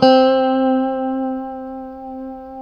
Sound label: C JC120; Guitars; Spectrasonics; Hans Zimmer Guitars VOL-1; JC-STRAT MF